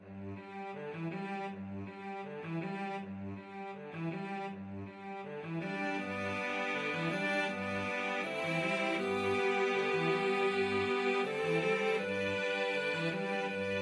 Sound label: cello quartet